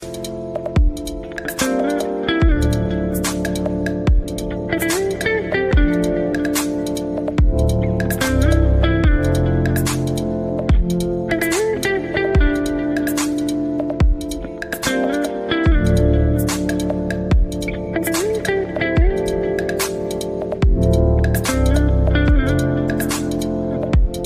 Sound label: great guitar ringtone